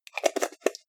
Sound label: jar plastic midsize close fast